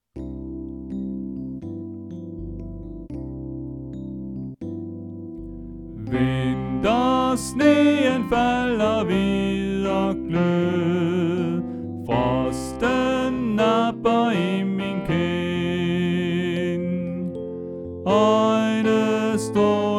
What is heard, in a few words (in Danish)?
Tenor; Stephans-koret; oevefiler